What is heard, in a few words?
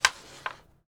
Single Strike Carrom